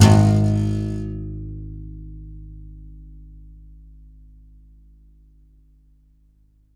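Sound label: contrabass balalaika, Plucked Strings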